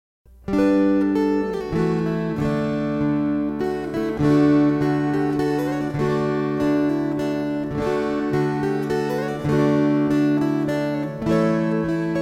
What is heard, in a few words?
Waltzes